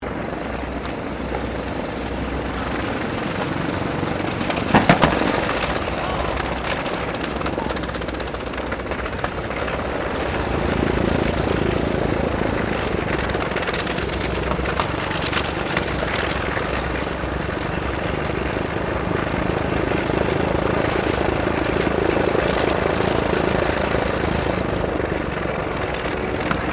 AIRCRAFT LOCKHEED L-1049 SUPER CONSELLATION STARTUP; Connie, Super Constellation; 1955; Airplanes; Vehicles